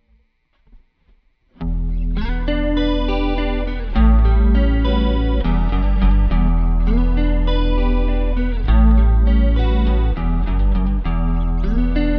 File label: jam